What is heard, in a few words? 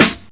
kick, drums; Zoom - Release 2; Active Software